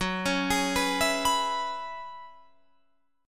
Gb 7th Suspended 2nd Suspended 4th, Guitar, Drop D